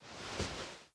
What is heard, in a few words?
Clothes; blue archive